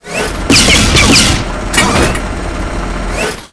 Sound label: Bouncer SFX Victory